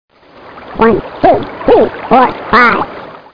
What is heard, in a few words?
Bug mixed; How Many Bugs in a Box